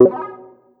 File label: power up